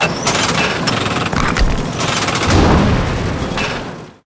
evil machine attack

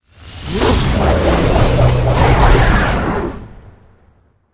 guardian attack, monster

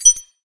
ring equip

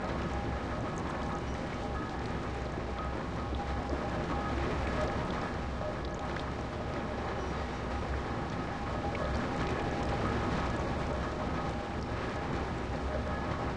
sewer; ruina

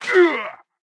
dark warrior damage, monster

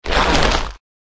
giant spider damage

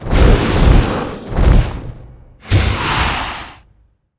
Boss Dark Dragon deth, monster

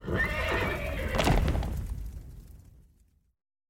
fallen steed death, monster

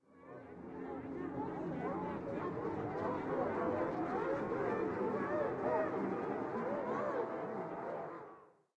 forest, lake

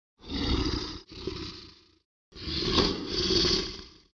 bug shark idle, monster

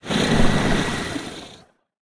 Boss MechNaga main, monster

